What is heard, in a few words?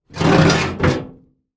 Household Metal Garbage Can Dragged On Concrete